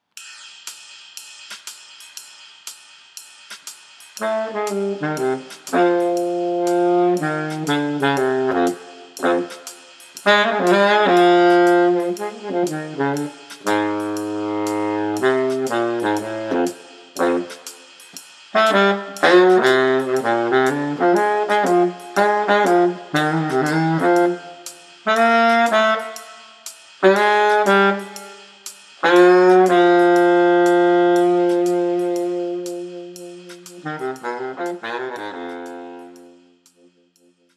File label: bari jazz swing